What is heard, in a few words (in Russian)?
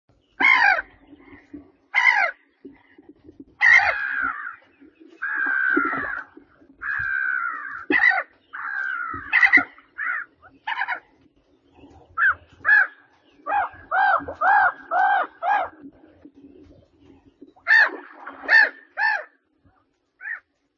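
chernogolovaya chayka golos, Mediterranean gull voice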